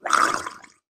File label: Burble